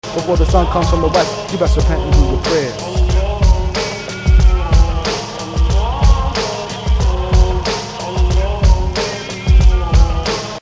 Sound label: islamic rap